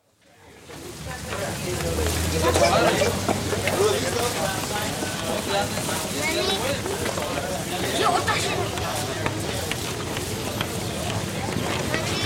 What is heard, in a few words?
Tijuana: Street market atmosphere; City; BBC